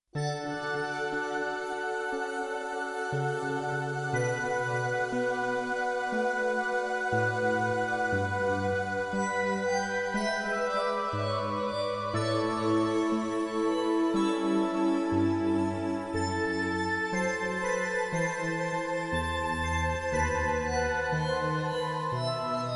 Air on the G-string

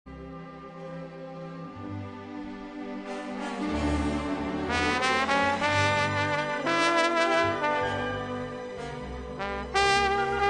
New largo

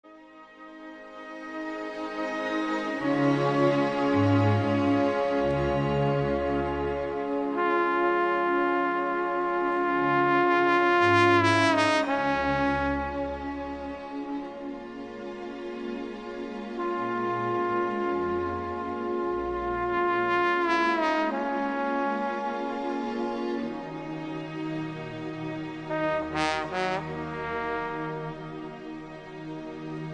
ragtime

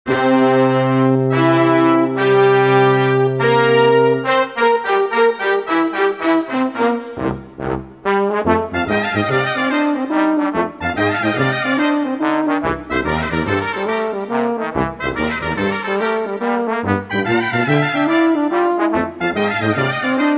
Boogie Woogie

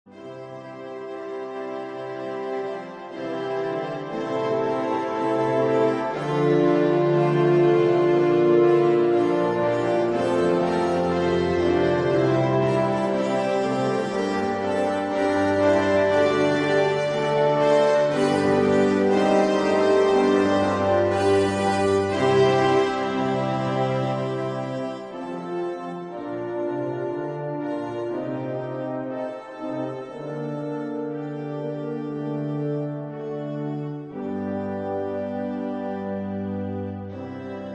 sonata

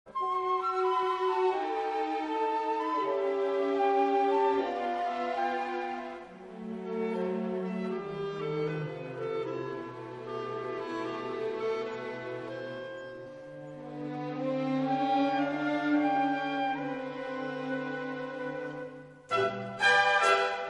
New orch

